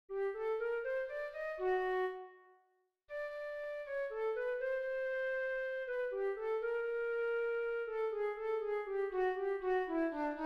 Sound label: canon